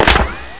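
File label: ricochet, weapons; Source Engine; bshift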